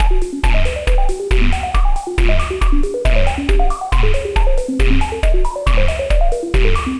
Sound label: Alien Techno; Sonic Foundry; Syntonic Generator